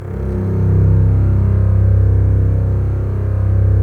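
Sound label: STR CBS, strings; Roland - LA Composer vol. 4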